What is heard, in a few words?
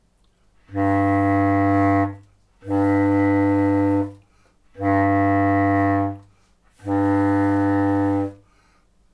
Heckel Bb2 crosskey then fork and repeat